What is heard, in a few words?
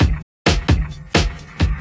drum loops; bb drum loops